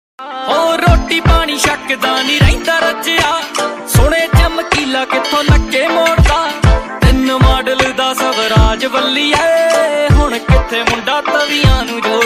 Punjabi Tune Ringtone

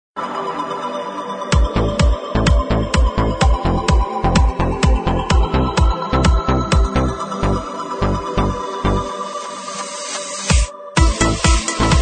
Techno Ringtone

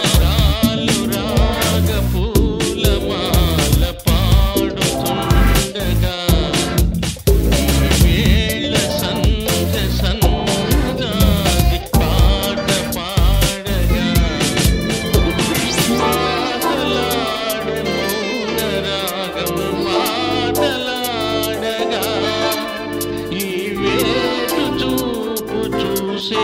Telugu Ringtone

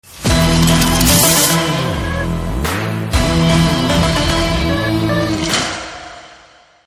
Teaser BGM Telugu Ringtone; V

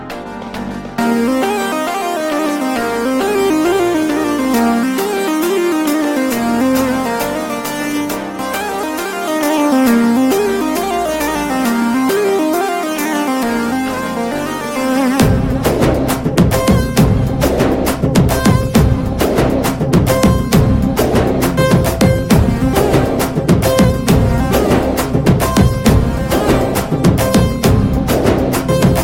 Drum Beats, Telugu Ringtone

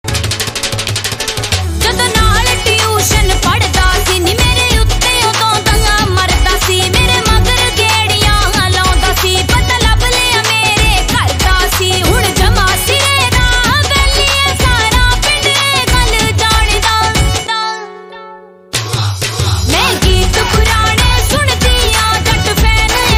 Ringtone

